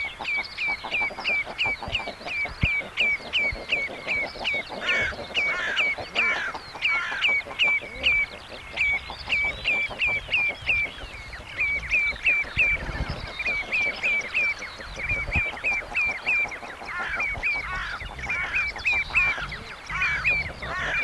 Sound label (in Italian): Cornacchia Nera